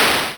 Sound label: hit